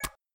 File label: unlock